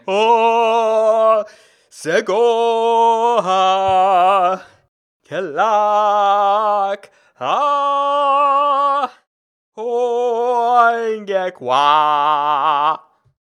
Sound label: sing